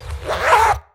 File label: unzip